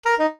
jingles SAX; Kenney's Sound Pack; Music Jingles

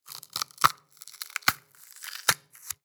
Pepper Crush; Fox Audio Post-Production; Vegetable Murder – Crush & Crunch

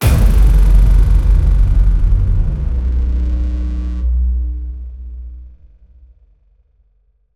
Impacts DESIGNED; SoundBits; Just Impacts

